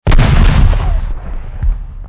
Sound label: IMPACT CRATER BOTTOM